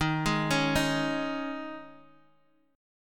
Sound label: Eb 7th Suspended 4th Eb, Guitar Half Step Down